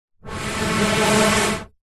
human blow air over end card tube short single blow; zapsplat